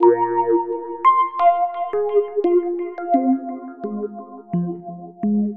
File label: Rhodes; Sonic Foundry (Sony Creative Software); Leo Cavallo Bass Taster